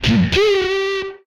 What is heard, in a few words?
Ker Ching; retro arcade; MMRetroArcadeSoundsPack